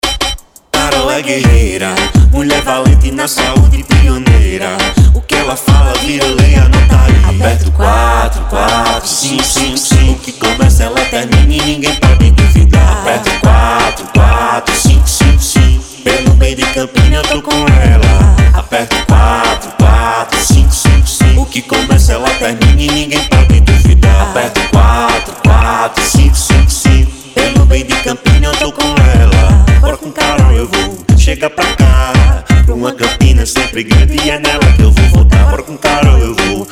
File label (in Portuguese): Jingle; Corte